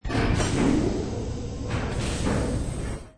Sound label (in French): porte; rollercoaster